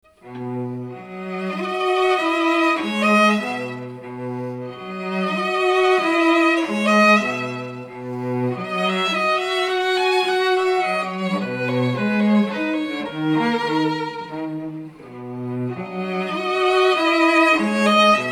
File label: string duo; Lakme